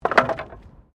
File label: Wood Move; Material components for constructing more complex sounds; Wood (Sound Effects:Materials)